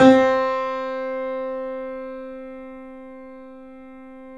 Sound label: C, 3-D Audio Collection, 3D Pianos, Yamaha C7